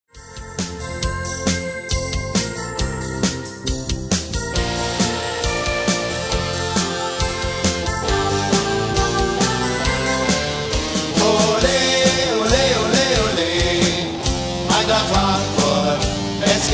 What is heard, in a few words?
instumental